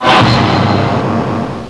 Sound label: ORCHEST